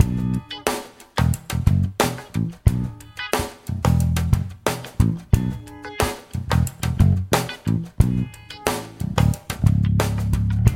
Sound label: solo loop